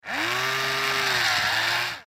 Toy Robot Servo, Toy Robot Servo Motor Movement Plastic; Miscellaneous Toy Sound Effects; Foley; SoundEffects-Blastwave